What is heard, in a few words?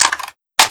m16 world reload